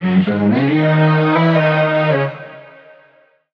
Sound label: vocal eternal Abm; SD AURORA Sample Pack; Slate